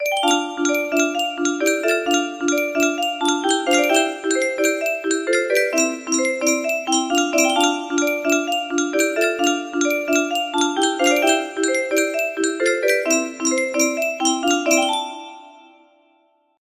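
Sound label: music box melody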